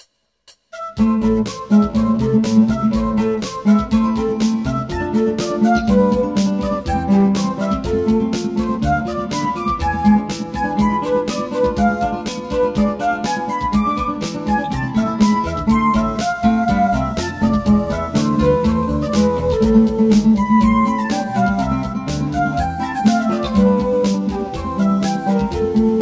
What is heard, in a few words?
Roland Floejte, guitar; Händel; Hjemmelavet Fra Gamle Kasettebaand